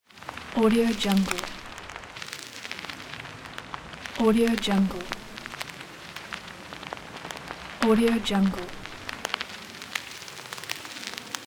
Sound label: vinyl